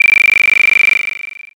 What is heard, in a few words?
trainbell